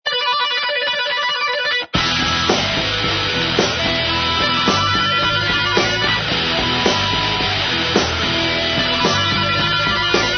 metal; Audiovox CDM 8915 Virgin Mobile Snapper

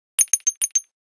Message, Nokia Alerts; Windows Phone apps

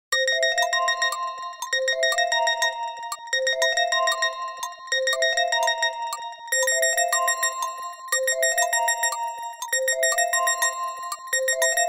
Clock Haste, Nokia Tones; Windows Phone apps

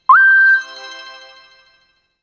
Email, Nokia Alerts; Windows Phone apps

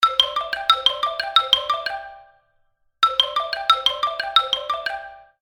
Xylophone, ringtones; Itel; Power 80

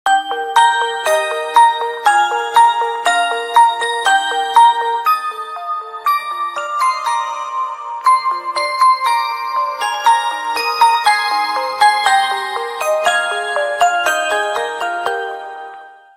A Toy Box, Ringtones; Samsung SCH-W770

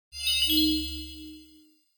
Message, Nokia tones digital; N79

